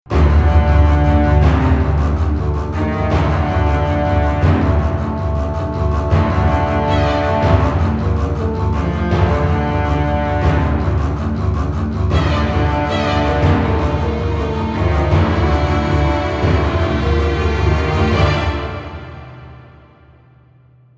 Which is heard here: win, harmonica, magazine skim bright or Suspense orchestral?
Suspense orchestral